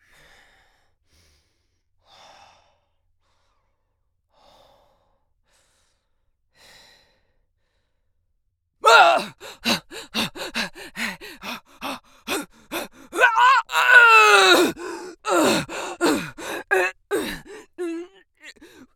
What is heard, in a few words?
Creature HUMAN BARBARIAN VICTIM, creatures vocal extremes